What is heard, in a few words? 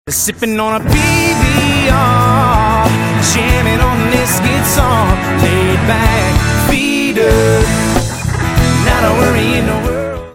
ringtone